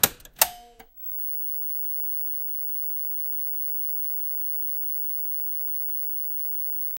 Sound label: turn on old tv sound; home appliances